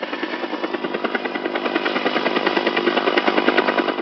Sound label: helicopter